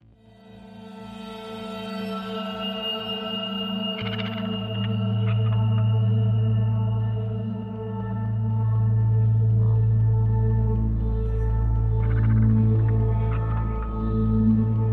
Modern Cinematic

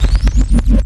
Glitch Fire Up

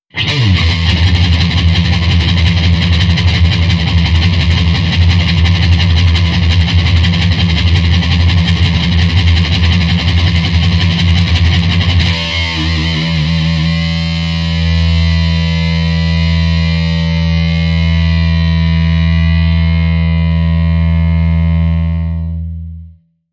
Distorted Highway

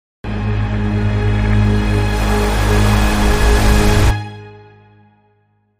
Crime Sting

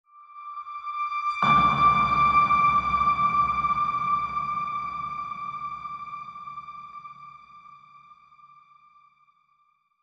Mountain Breeze Icy Ping

